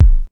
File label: Kick, Ensoniq ASR-X Pro